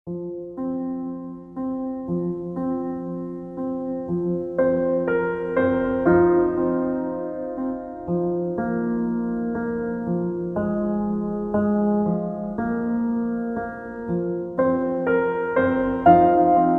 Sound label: Underscore Only Piano